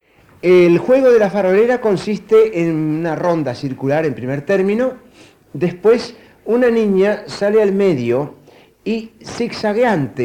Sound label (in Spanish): ronda infantil